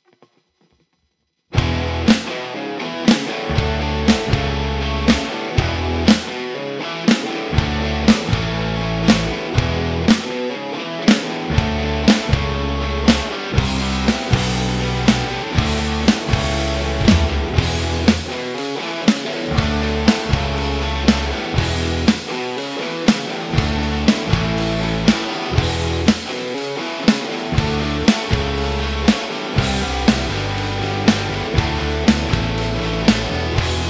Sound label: Tradrock in E